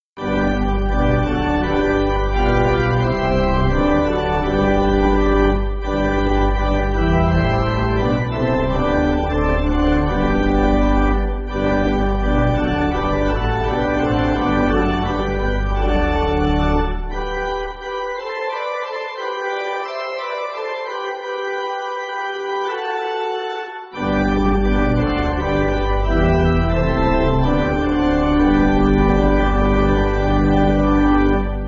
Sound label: Pipe, church music